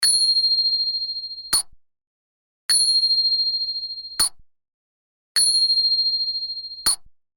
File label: Bell